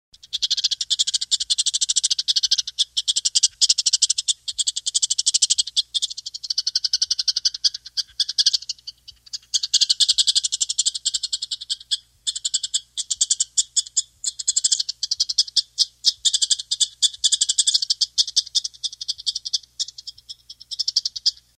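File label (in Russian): звук бурундука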